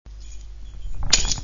texture cles qui tomben

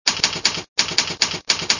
battement sons dactylo

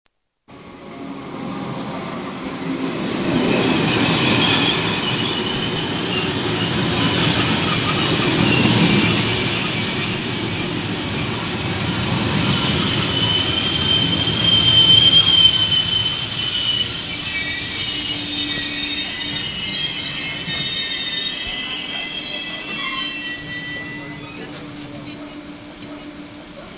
ambiance train

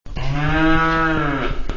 nature vache meugle

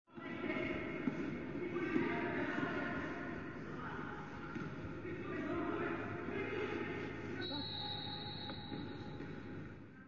ambiance halle sport

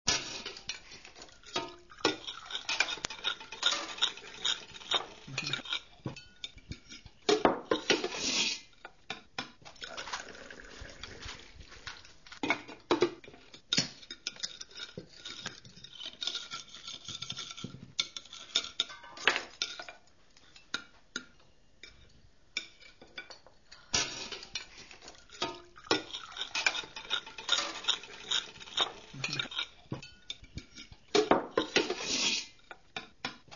ambiance bruit repas

